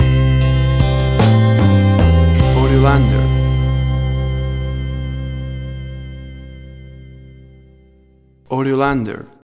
60s Surf Ballad Stinger

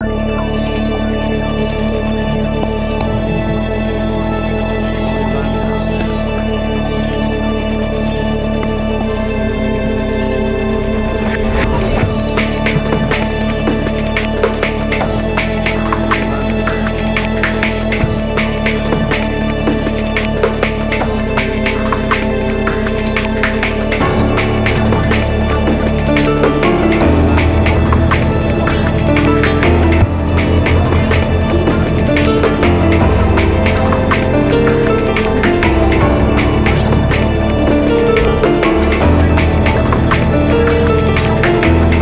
Action Thriller

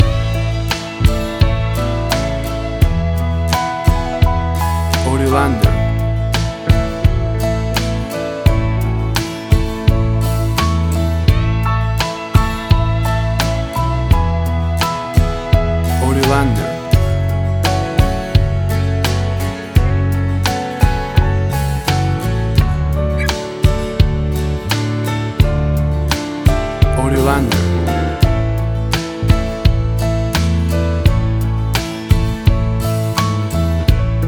Warm and Soft Country Wedding Music

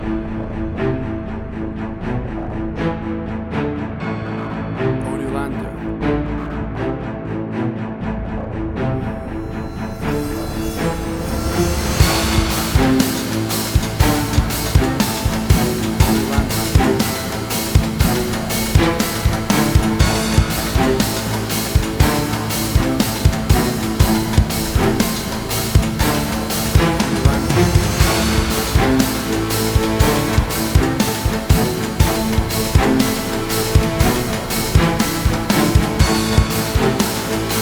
Big Epic Music

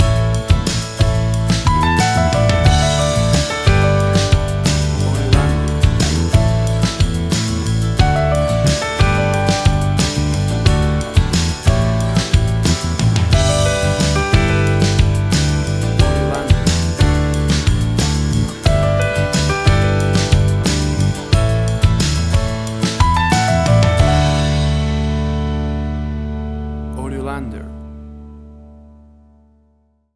Acoustic Guitar

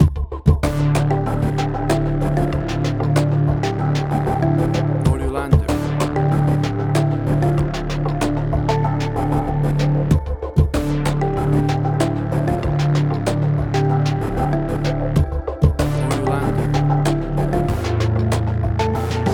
Atmospheric Fuzzy Synth Tension

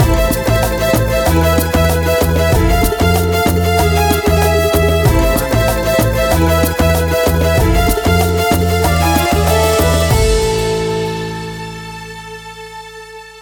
Bangalore Marketplace Music

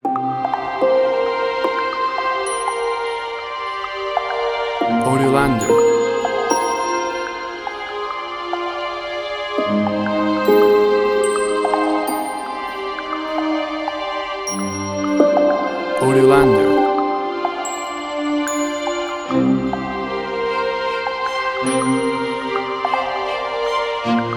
quietrain

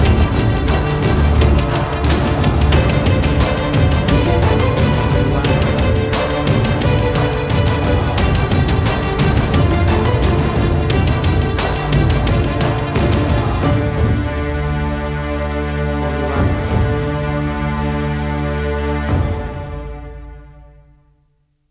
Big Action Epic Dramatic Film Score

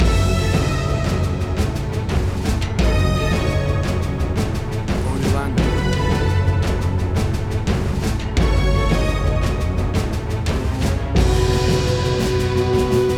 Big Action Movie Trailer Sound

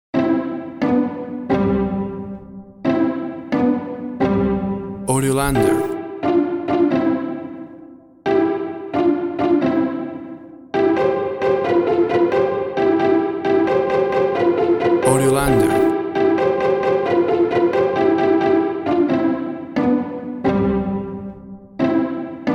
Pizz Strings; A playful and exciting Pizz Strings version of t; THREE BLIND MICE PIZZ STRINGS

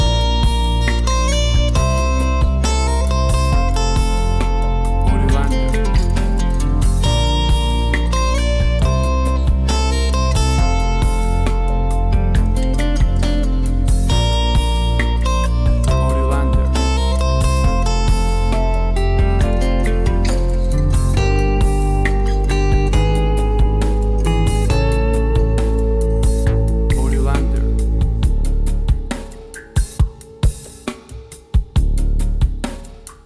Acoustic Band Riffing; Acoustic band suspense tension bu

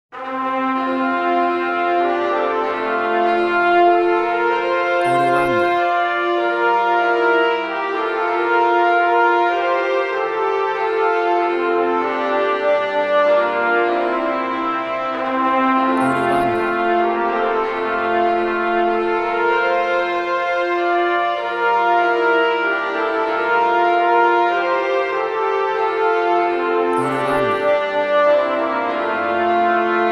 Away In A Manger Brass Band

